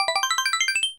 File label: Social Chime